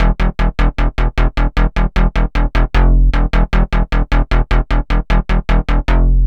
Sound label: Psycho Bass; Dr. Fink's Funk Factory